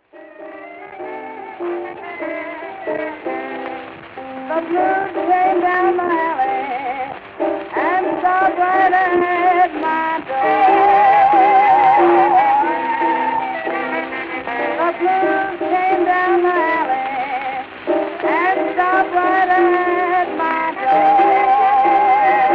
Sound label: lonesome blues